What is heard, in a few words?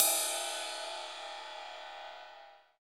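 Cymbal Ride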